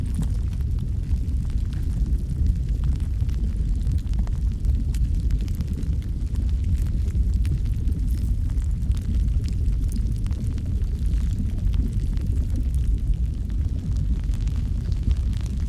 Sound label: Lava flow; fa battlemaps